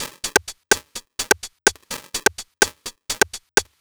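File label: percussion, Drum Loops 126bpm, Nu Dance Electro; Zero-G - Soundclash; Downward Spiral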